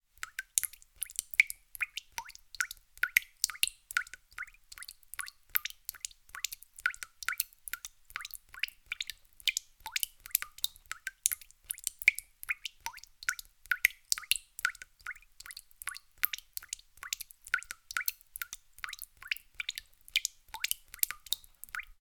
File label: tap drip into sink close in bowl, household